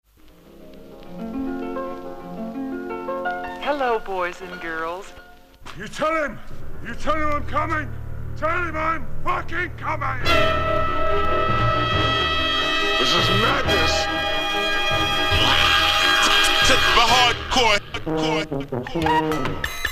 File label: hardcore mixtape